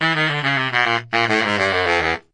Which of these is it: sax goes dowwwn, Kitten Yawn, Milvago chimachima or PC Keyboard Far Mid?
sax goes dowwwn